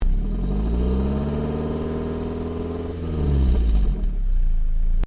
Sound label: Car Ford Focus Idle Rev Exhaust; Ford Focus hire car